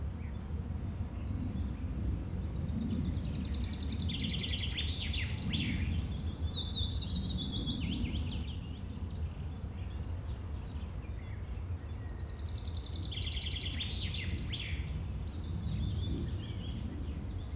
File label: wood bird ambience